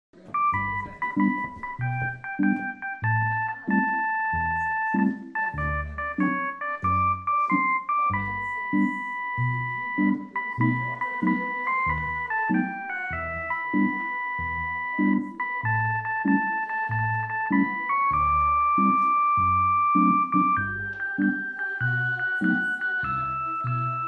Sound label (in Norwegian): Fru Pigalopp